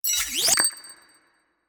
Result Reward

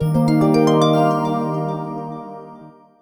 Hexagon Jingle; wii street u powered by google wii u gamerip 2013

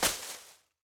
foliage hit; teardown; ps5 windows xbox series xs

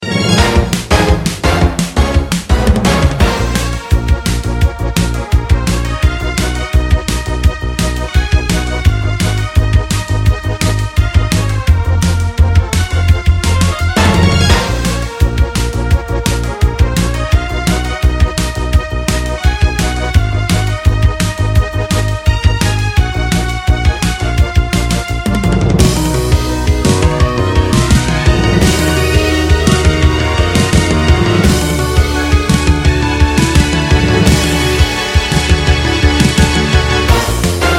classic Battle Theme; Siralim Ultimate

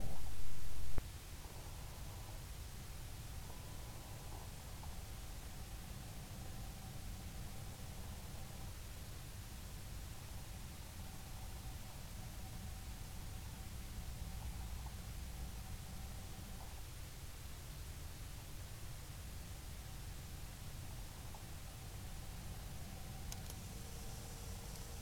camera ambience

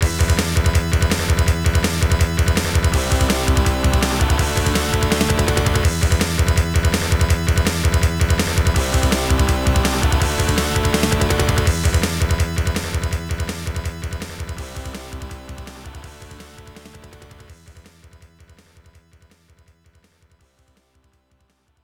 Score Tally; Air Rescue; System 32

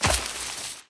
Character Footsteps; Unreal Development Kit

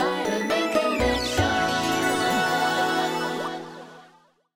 gameplay radio station intro

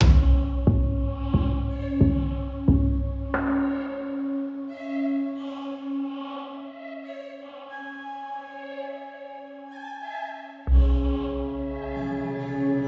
Ruin Loop

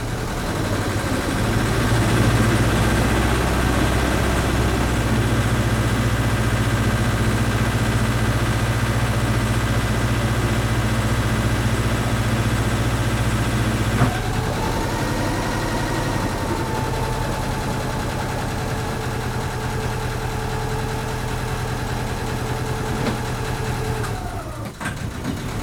vehicle yacht drive; teardown; ps5 windows xbox series xs

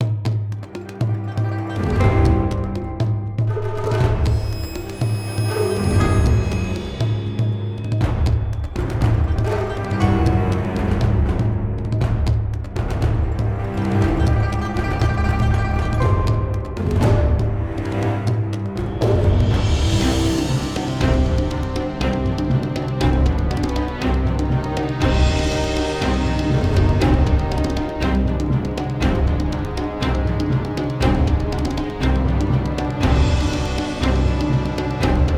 BGM; hidden dragon legend

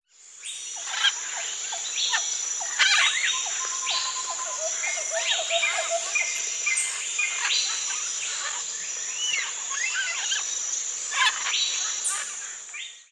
Jungle Loop

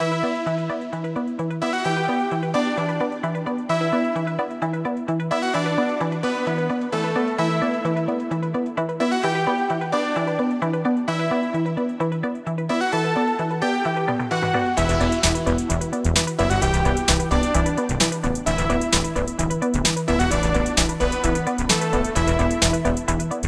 Music 130bpm New Drums; Sockventure

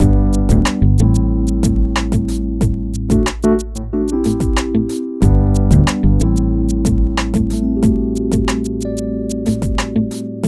Boss Office Music

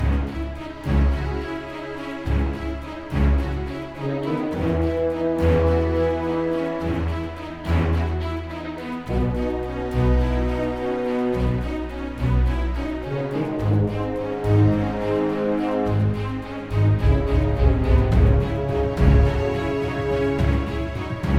Results Screen Loop; skyhook

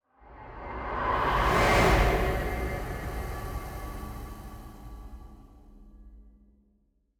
Transition; Se Solo Transition 01

